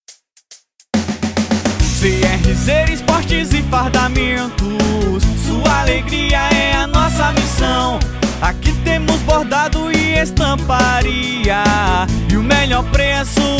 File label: jingle; crz sport e fardamentos